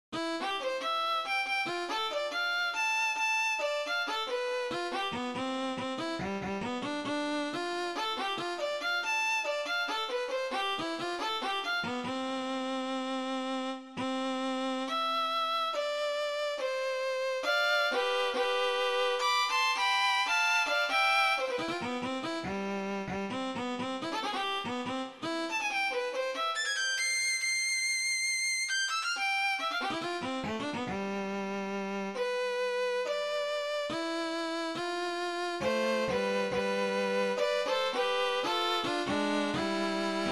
violon valse

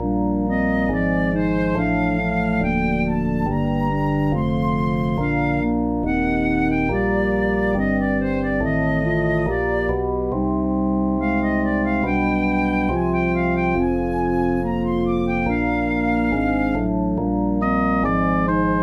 orgue

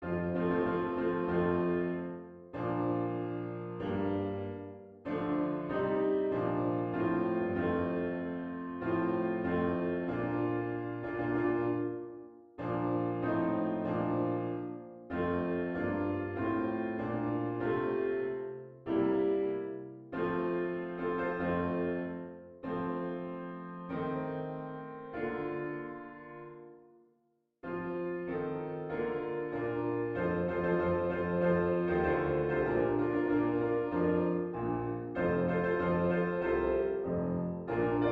hymne national belgique

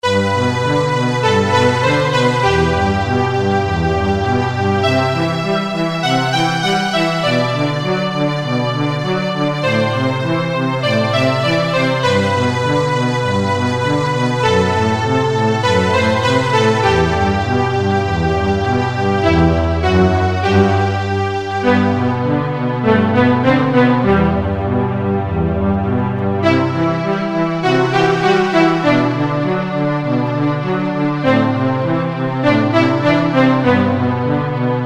petit duo pour violon et violoncelle

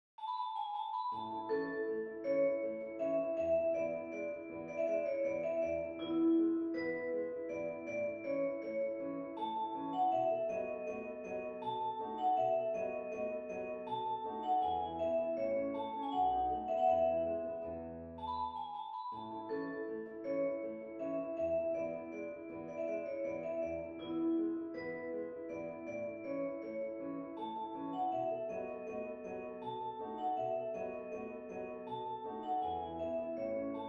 menuet quintette cordes; boccherini luigi

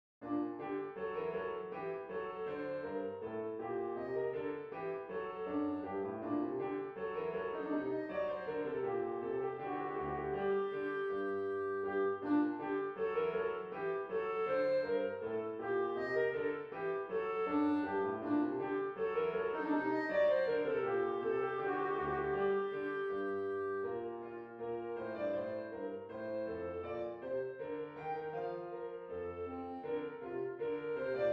rigaudon

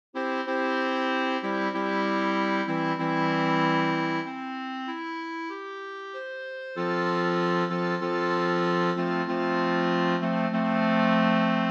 quatuor pour clarinettes; vignon denys

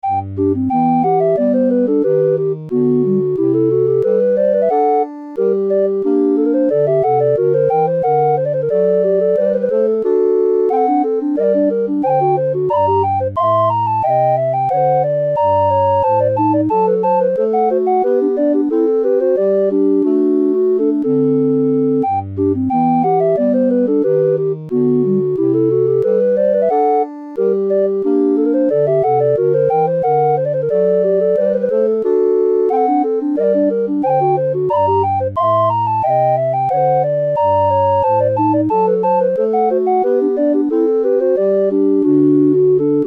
orgue

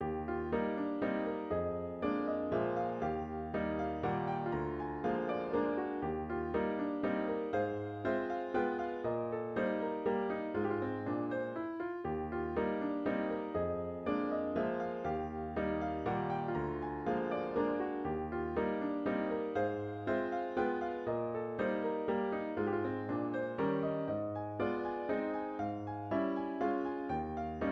valse

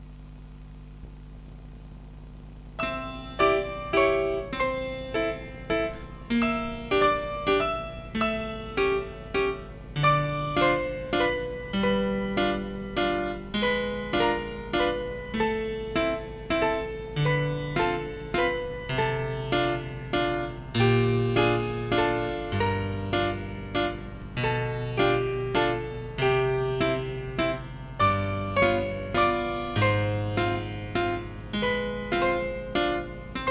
piano joyeux

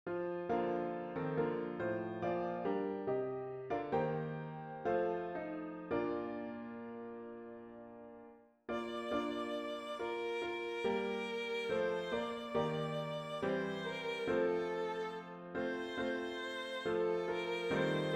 hymne national

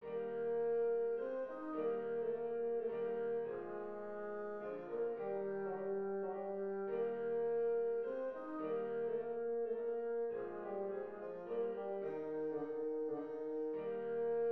danse allemande mib majeur; haydn joseph

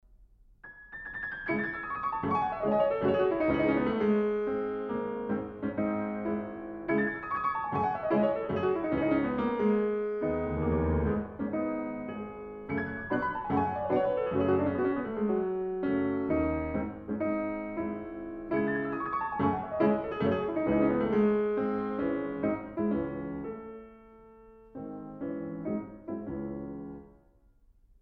prelude en do diese mineur allegro molto; Frédéric Chopin

